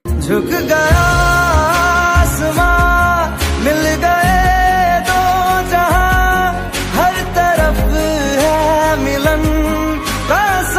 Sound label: Sad Song Ringtone